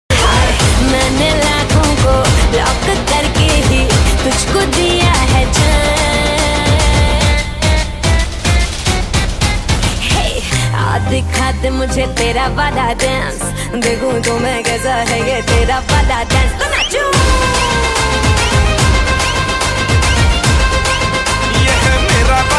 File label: Dance Ringtone; Simmba Movie